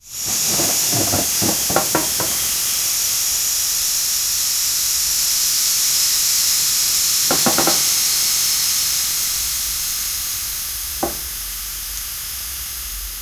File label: baloon deflating; party